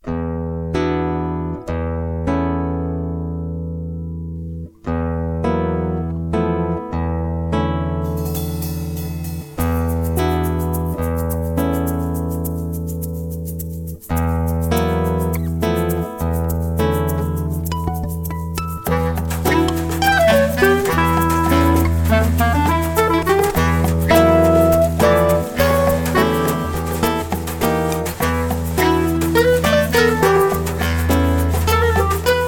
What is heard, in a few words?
BLUES, JAZZ; ENGLISH